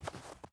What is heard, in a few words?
footstep rubber boots snow walk; Rust